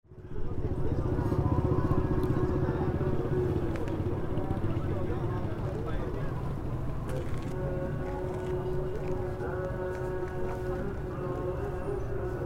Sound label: Field Recording Yazd; Identity Lab; Philip Reitsperger